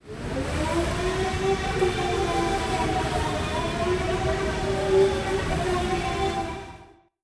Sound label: air raid siren; Stellaris